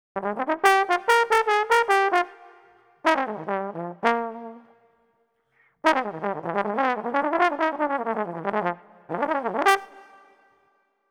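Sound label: Jazz Trombone, Fmin, 075 bpm; Brass; Pro Tools Essential Loops; Digidesign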